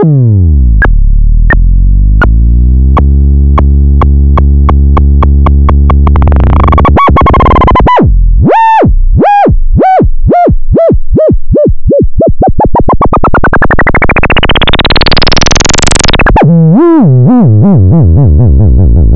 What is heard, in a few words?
squine fm pulse